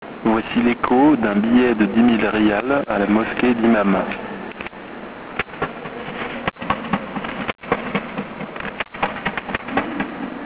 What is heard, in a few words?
echo